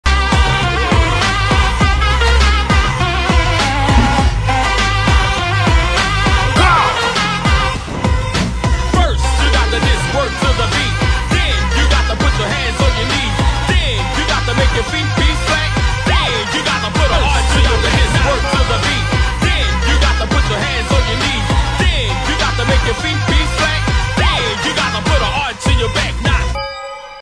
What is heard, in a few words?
interval bgm ringtone